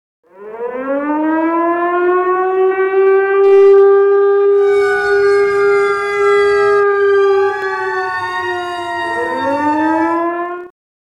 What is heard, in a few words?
Sirens